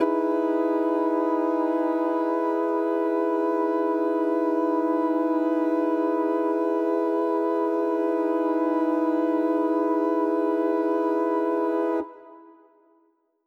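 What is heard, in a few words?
Mixed Winds E major 7, synth orchestra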